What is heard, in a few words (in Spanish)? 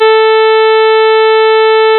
a4 violin cutoff 4000Hz